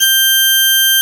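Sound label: Church Organ C6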